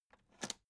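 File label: aluminium case small open short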